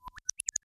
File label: Fish